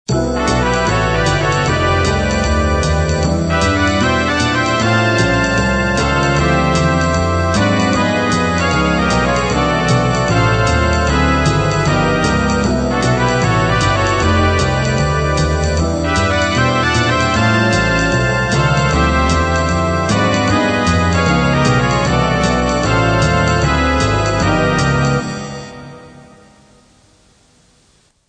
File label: triad